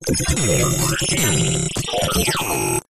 science fiction cyberpunk computer equipment or machine chirps beeps glitches; zapsplat